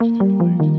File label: Little Note Run; Solos; BB 2HTC Samples Vol 4 Addendum